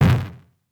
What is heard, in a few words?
card fire atack